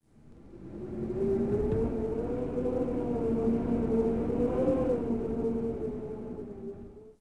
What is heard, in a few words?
Tundra Wind Gust; Civ4 warlords